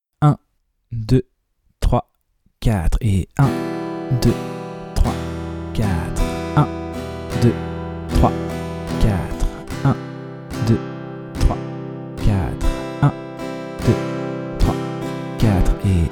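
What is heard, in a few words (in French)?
lent La rythmique rapide avec la dernière grille, suites d'accords; De 0 à vos premières suites d'accords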